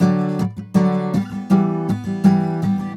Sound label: AM GTR, Celtic Flavours